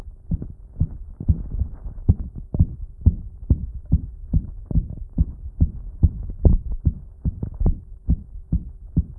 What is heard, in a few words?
SINGLE ATRIAL ES, Heartsound; Heartsound Library